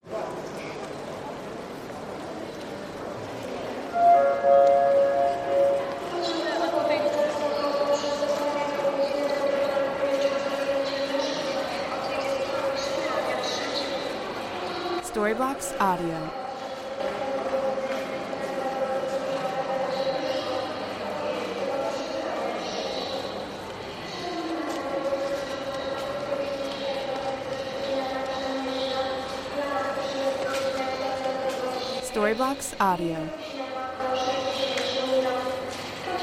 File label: train station noise looping